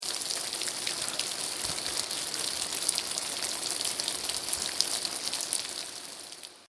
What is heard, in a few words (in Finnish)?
Sade